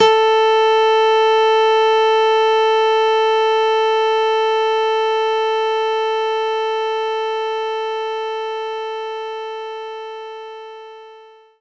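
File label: nylon guitar A4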